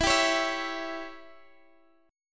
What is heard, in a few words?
C sharp Diminished, chord